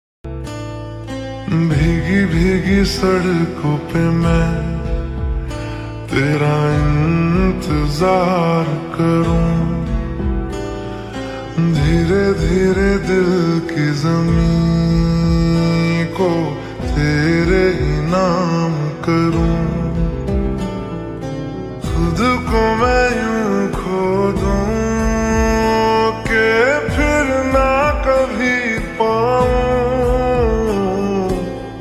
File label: Ringtone Slowed Reverb